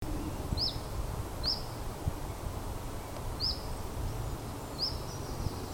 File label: sweeoo swee